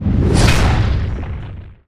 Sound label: attack; Condra; Karutan